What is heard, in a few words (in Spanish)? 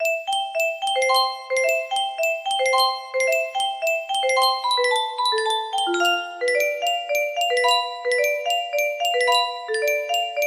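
jota tradicional, music box melody; autor desconocido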